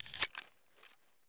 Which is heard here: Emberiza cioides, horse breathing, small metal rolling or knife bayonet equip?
knife bayonet equip